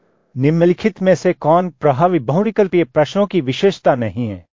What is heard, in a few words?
techno